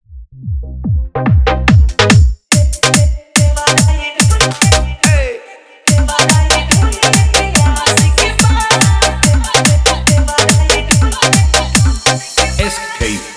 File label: Bhojpuri Remix Song